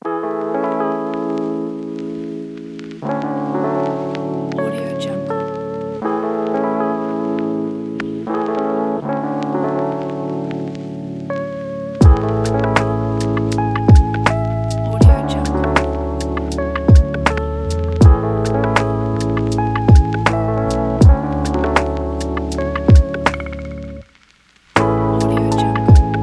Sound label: chill lofi